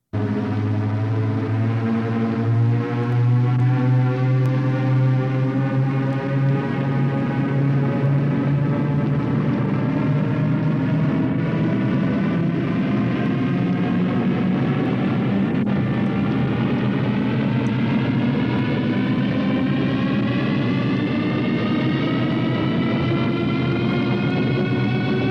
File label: Warp Drive Acceleration; TNG